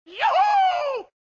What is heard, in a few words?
cowboy yahoo; Cartoon FX